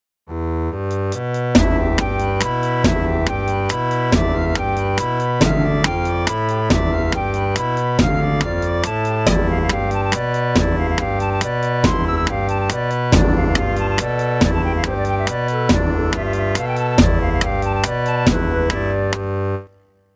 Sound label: waltz